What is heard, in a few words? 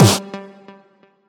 combo power; quatuor